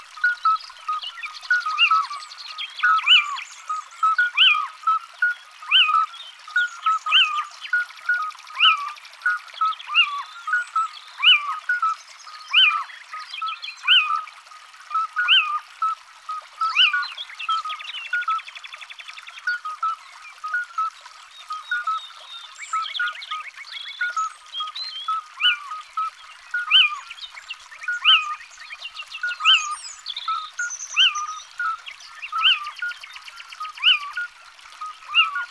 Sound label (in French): Chouette cheveche; abc de la nature